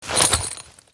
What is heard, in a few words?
zippers and duffle bag